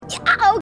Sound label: yeow